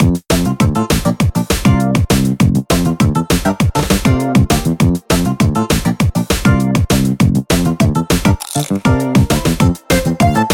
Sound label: Bgm Main; Cookie Run